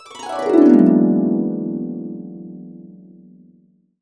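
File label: E2, Complete Harps; USB Soundscan vol.62 - Complete Harps; AKAI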